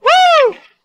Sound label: woo